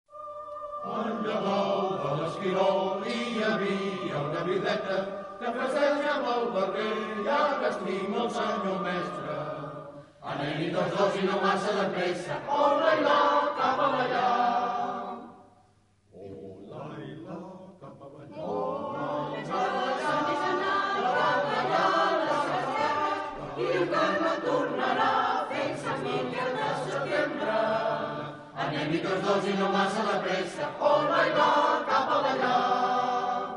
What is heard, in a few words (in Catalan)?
coral; 4a Bienal Coro Mixto Pena Santa